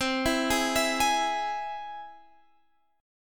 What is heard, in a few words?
C Augmented, Guitar Standard, chord; arpeggiated; 20,19,18,21,21,x; JGuitar